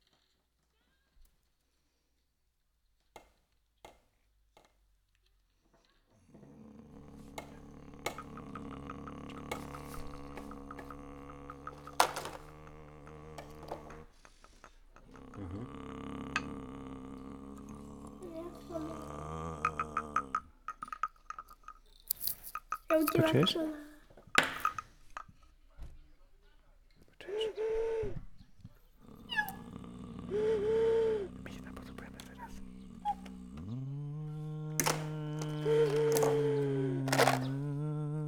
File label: birdsongs